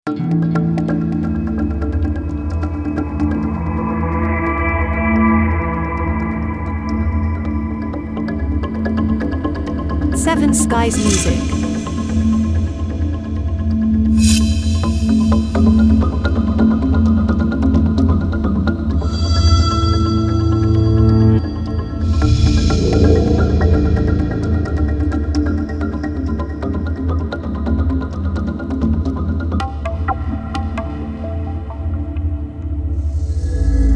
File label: Ambient Suspense Dark Skies